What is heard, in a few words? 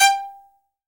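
violin staccato G 4; AKAI S-Series CD-ROM Sound Library VOL-7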